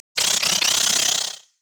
young lizard angry; LoL; monsters

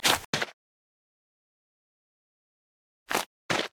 emote laugh; LoL; varus

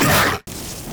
bear effort; LoL; annie base

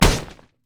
basicattack; pantheon

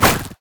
satchel charge; LoL; ziggs poolparty

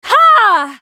spell cast effort, en US; Riven

